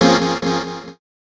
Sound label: chords